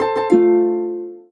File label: klong; speech; tts